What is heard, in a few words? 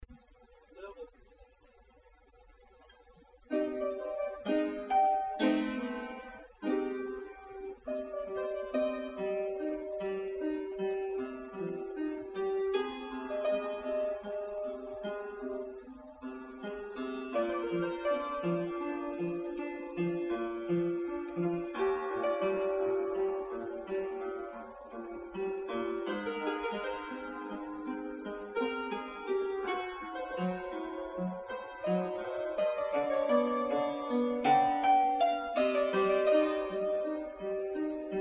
Piano